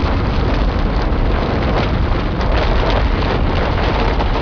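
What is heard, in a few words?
ambience rumble rock loop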